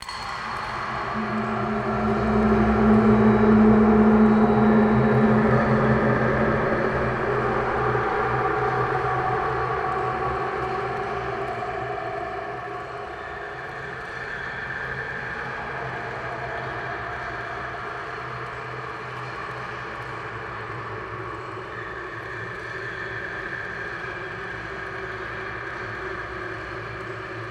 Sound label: Spook Wind Ambience